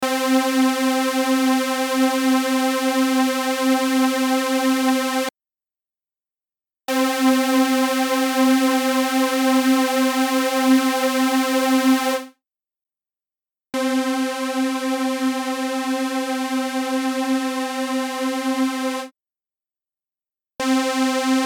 Saw Examples

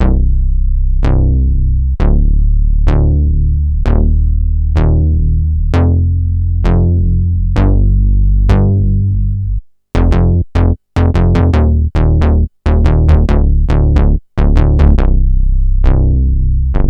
soft synth vs Minimoog Model D

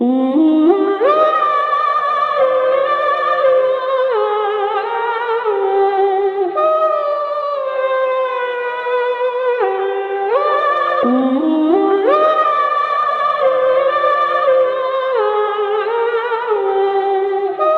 female vocal dry; wavefield

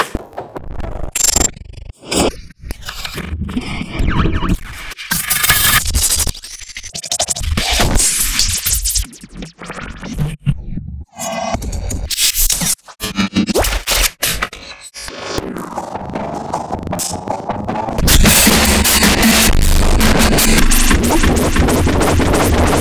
drumloop after; friendgineer labs

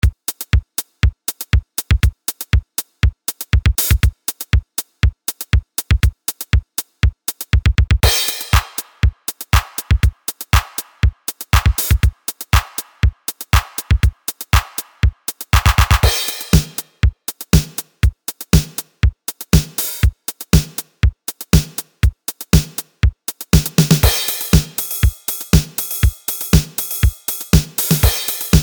d7x7 multi out; d-727 by superwave

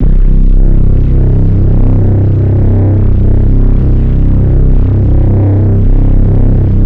phonk reese C; phonk toolkit; rocket powered sound

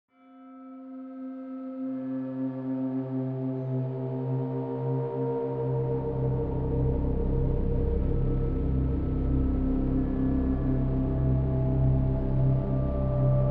glockenmaschine lost; schallenberg engineering

